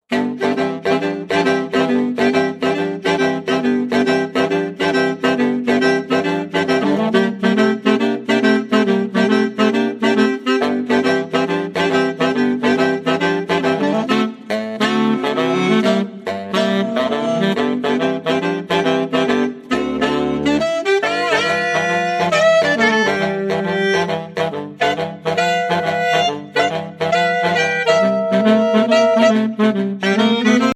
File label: Train Talk, Saxophone Ensembles